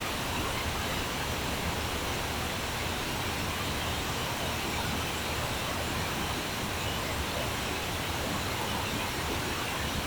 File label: microphone on top of speaker playing pink noise